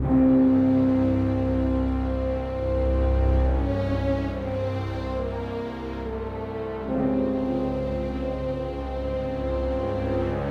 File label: Ambient Theme